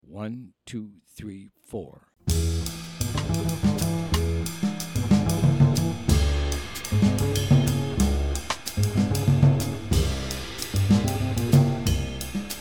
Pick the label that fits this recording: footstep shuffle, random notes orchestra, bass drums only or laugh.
bass drums only